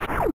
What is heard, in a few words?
Power Beam Shot; Metroid (USA)